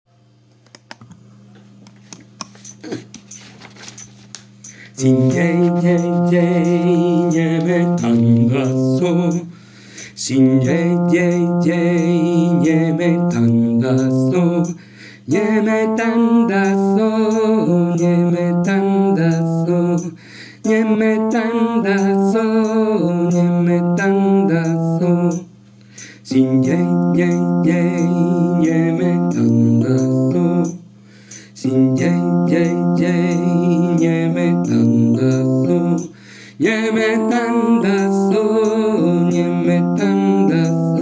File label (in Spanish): Bajo y tenor